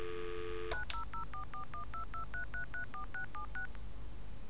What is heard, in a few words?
touchtone